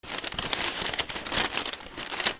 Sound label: PAPER